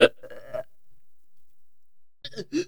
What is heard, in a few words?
horror